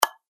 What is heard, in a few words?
press